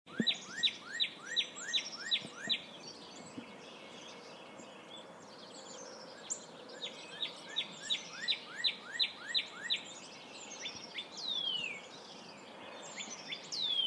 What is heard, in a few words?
Yard Birds, Ducktown TN